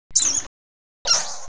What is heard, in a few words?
squeal, degu